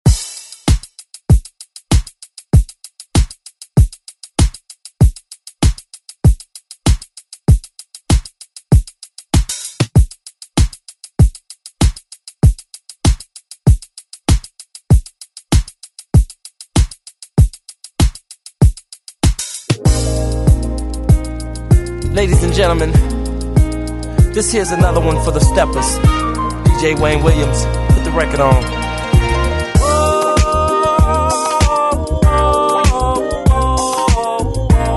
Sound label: Re Drum; DJ Mhark